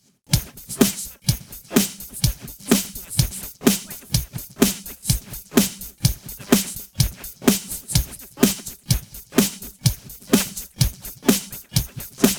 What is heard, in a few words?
easy percussive